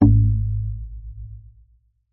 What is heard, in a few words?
Pvc Tub Bass